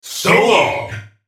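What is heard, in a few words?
spellcast effort; Jayce Sentinel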